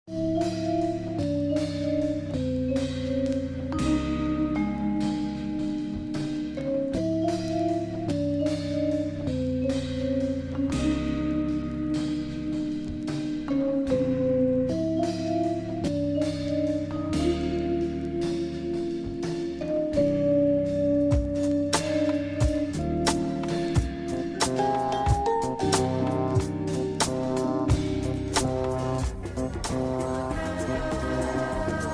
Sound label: Timeless & Essential Hip-Hop Beats